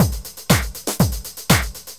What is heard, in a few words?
LOOP120; Sounds Good - Eurotech